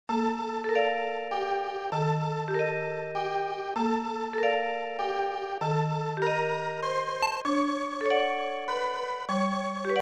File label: music; e.v.o. search for eden; gaia